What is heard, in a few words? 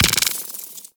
Mortar Mine Drop; Warframe; Venus; Venus Terra Mortar Moa; Abilities